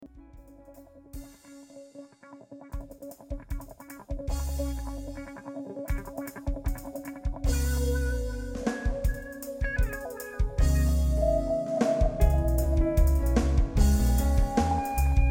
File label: Simply Funky